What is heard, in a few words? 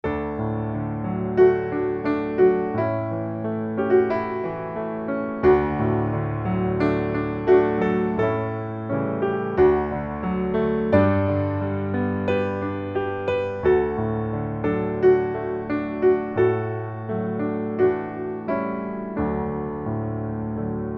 piano